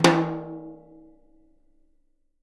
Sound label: Living Drums, JZ XTOM 1; Sampleheads; Peter Erskine Living Drums